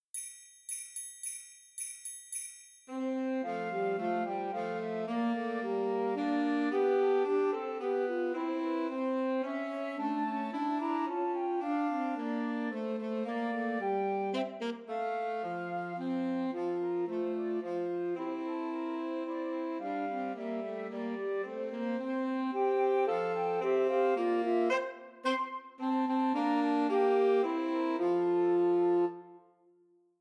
We Wish You a Merry Christm, Christmas Trios for Flute Alto Sax and Tenor Sax